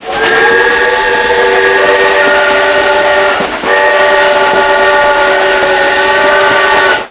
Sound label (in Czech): piskani; Mafie 1